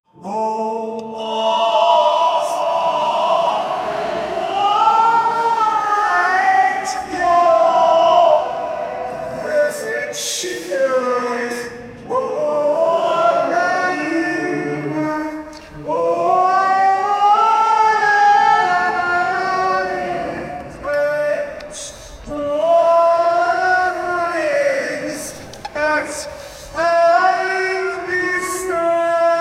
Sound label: crying; human emotion